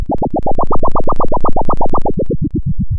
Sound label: pop, Sound of a duck